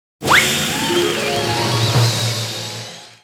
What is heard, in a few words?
(Sfx) Exit Open